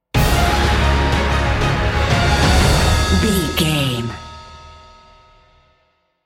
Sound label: epic cinematic sting bumper